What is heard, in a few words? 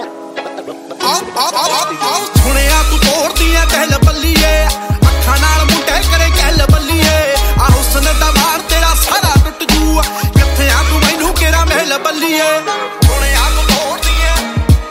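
Punjabi Song Ringtone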